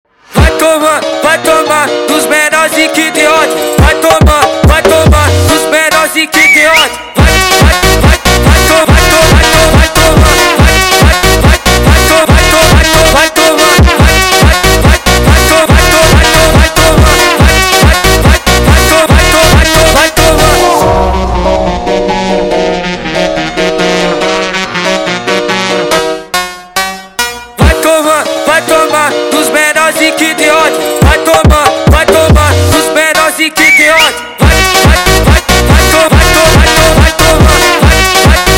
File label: sped Up